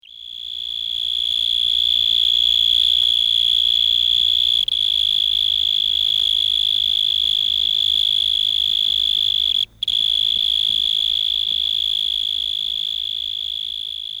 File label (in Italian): grillotalpa, Insetti; Monte Pisano; Animali; I Tesori del Monte Pisano - I Volume Gli Animali